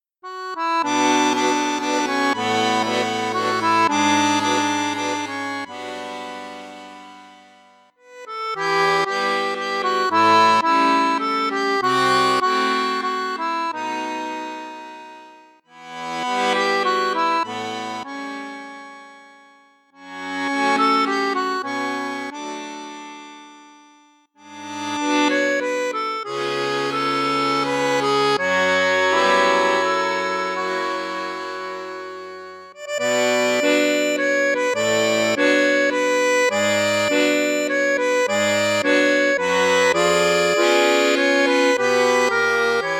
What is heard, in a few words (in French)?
registre basson piccolo, accordeon